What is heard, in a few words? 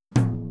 Boogaloo, Intro, Tom Rack, Drums, 82 BPM; Sonic Foundry; Drums from the Big Room The Mixes